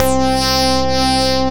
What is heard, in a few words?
pinch allowance lead; Bass; Synth Lead; Ableton; Creative Extensions